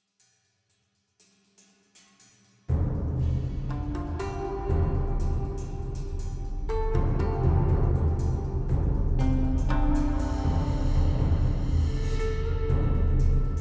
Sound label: Great Wall Front